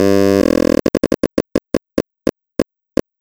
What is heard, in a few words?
Industrial Spinfill; Sonic Foundry (Sony Creative Software); Synchro Funk